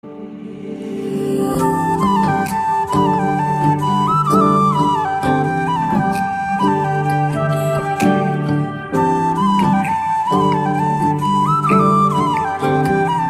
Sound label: Flute Ringtone